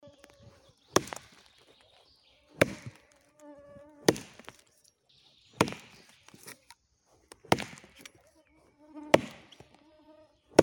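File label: rubka dereva v lesu, chopping wood in the forest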